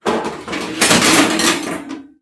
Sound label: oven rack slide in or out